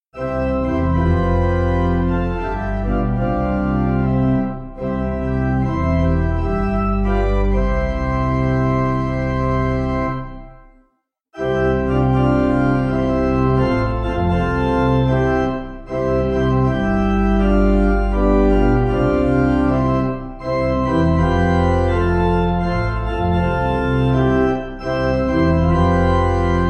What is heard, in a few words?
Pipe